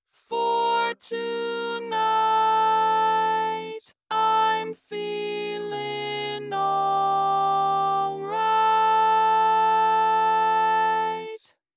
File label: Tenor, barbershop tag